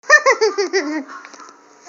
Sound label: laugh